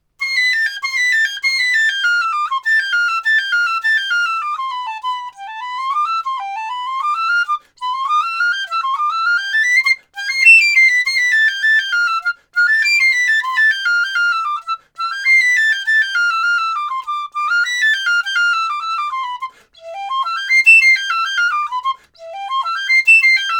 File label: Yamaha YPC32 Piccolo